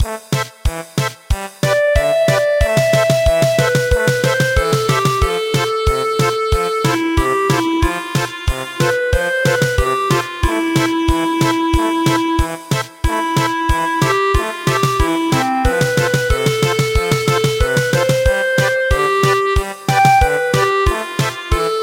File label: German Polka